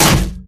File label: bullet heavyballistic titan; Titanfall 2